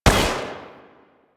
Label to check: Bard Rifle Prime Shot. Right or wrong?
Right